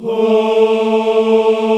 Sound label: VOX African Ahs; Roland LCDP11 Africa VOL-1